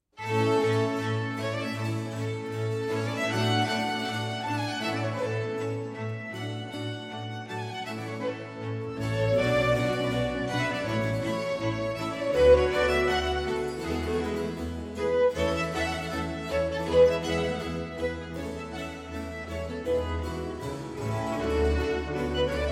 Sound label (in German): B-Dur 2. Satz; frantisek jiranek concertos